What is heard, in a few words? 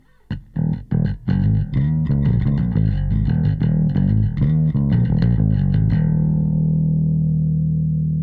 bass; Xtressor